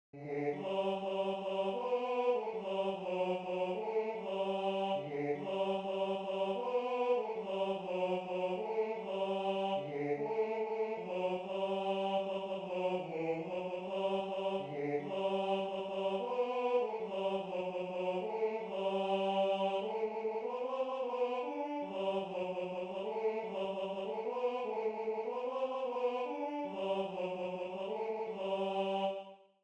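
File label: male choir, Tenor II; Mir lebfrischn Hirtn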